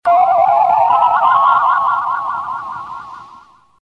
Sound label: replicator, switches